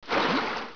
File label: WATER